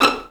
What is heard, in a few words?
VI PIZZ E5, Advanced Orchestra; Best Service; Peter Siedlaczek's Advanced Orchestra